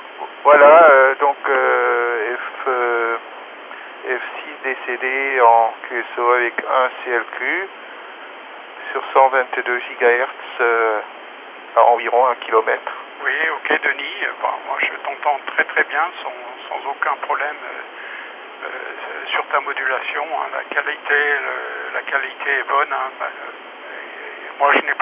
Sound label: 122GHz FM duplex; trafic